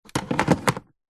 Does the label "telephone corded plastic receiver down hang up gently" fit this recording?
Yes